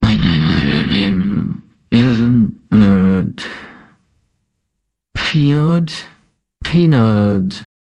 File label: jingle